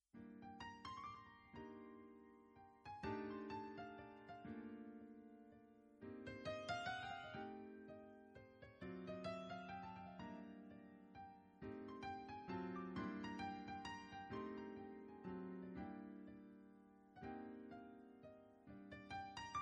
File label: piano